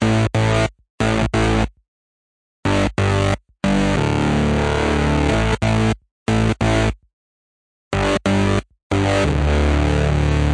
091 uninspired-distorto-bassline, synth and electronic loops